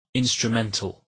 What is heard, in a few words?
instrumental